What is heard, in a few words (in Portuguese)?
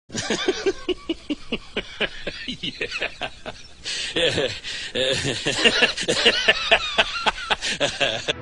risada